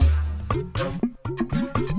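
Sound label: Music Dpad Base 120; From Dust; ps3; xbox 360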